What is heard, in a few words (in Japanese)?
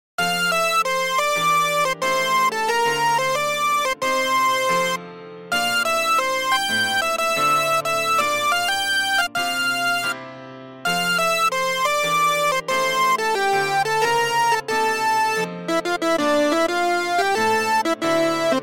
コード変更例